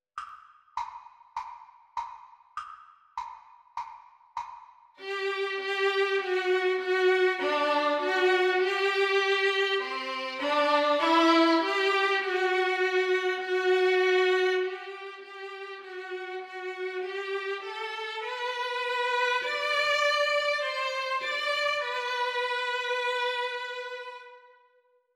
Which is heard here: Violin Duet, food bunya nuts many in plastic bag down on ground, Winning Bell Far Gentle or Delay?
Violin Duet